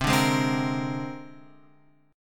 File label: C 7th Suspended 2nd Sharp 5th, guitar; C7sus2#5 Chord {8 5 6 5 X 6} strummed